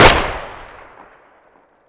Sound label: saiga outdoor close; Escape from Tarkov